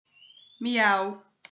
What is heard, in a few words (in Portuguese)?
miau